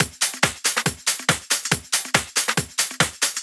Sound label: Addon Loop, Drum Loops 140bpm, Perc Loops; Trance; Zero-G Trance Inducer; 90 s Sample CDs